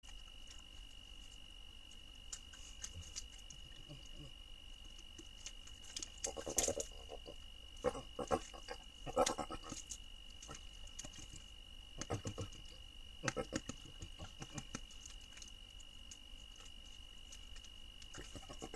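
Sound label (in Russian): zvuki pekari, peccary sounds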